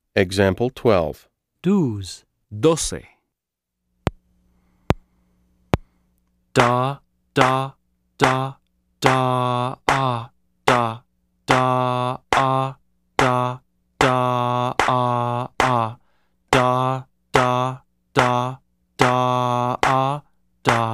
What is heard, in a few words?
Keyboard